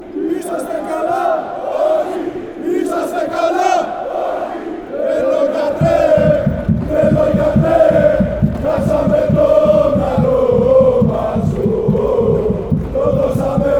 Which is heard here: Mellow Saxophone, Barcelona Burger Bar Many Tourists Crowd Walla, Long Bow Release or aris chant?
aris chant